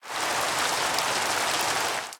rain, weather; ambient; minecraft

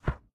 close put, book; minecraft

